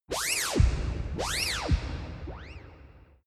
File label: lightspeed jump arrival, raumschiff